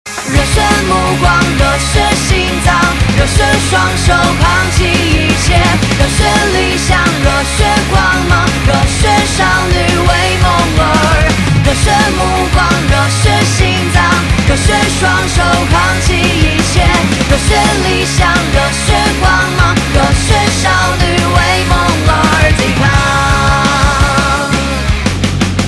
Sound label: music; pregnancy guard android